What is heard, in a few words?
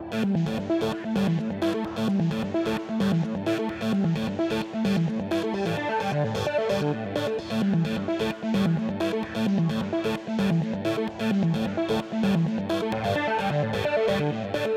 music gameplay STRESS intro keys; gladiabots